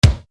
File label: KICK, Yamaha RY-30